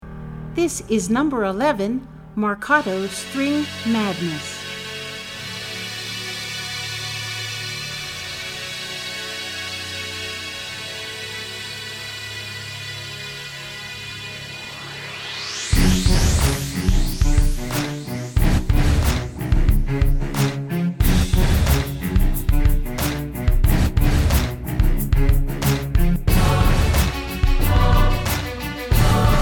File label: Marcatto String